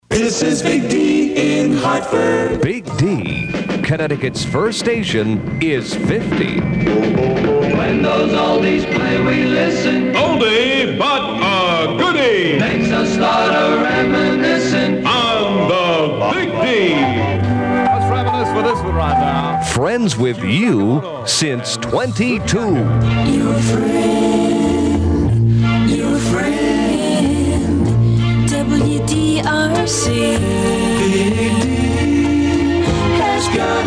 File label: old jingles